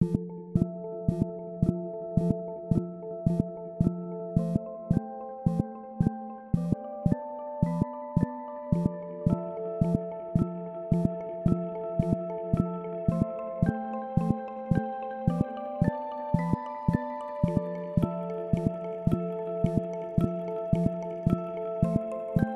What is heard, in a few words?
Ethereal Mallets; Motorola; Edge 70 Max China; alarms